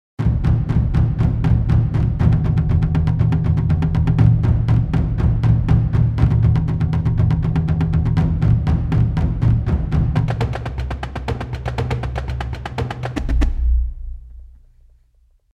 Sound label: Epic Drum; Ensemble Designer; Circle